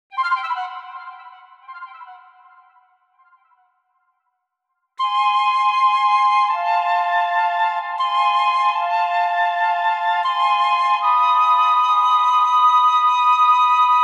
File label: The Azure Flute; Pokémon Legends Arceus